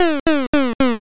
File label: laugh single